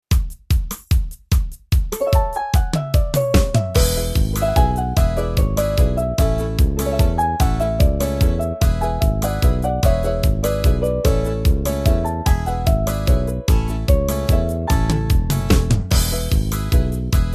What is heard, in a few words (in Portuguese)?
ritmos yamaha polca paraguaia